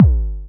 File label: Short, Kick, Traditional; Multi-Kick; Long